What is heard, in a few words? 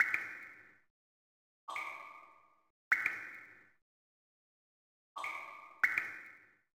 cave ambience; Kirby Mass Attack